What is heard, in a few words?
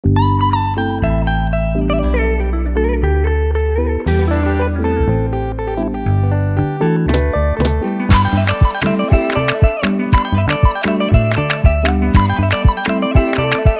Real vs Fake guitar; Bazehits